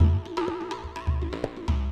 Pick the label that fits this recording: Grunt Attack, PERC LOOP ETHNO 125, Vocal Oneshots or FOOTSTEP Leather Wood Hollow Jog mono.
PERC LOOP ETHNO 125